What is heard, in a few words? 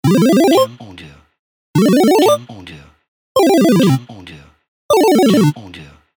Game Sounds